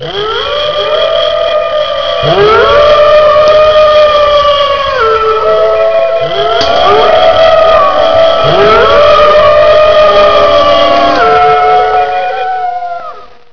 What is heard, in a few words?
pack cry, wolf